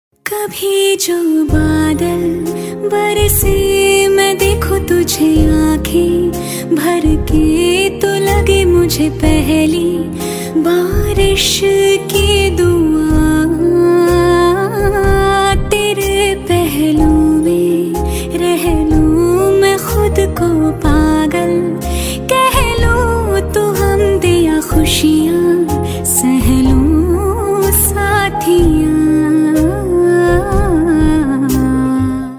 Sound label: Female Ringtone